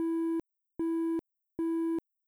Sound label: Buzzer Done